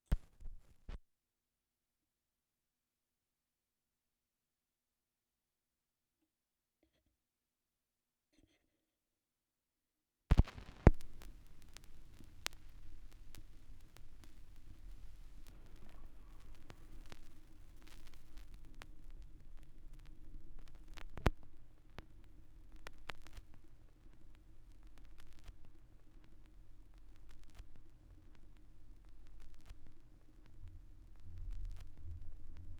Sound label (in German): schallplattengeraeusche